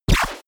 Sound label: laser silencer; krieg-waffen; soundpool